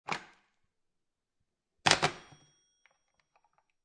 Phone Pick Up And Hang Up, Household, Phone, Pick Up And Hang Up Old Fashion Phone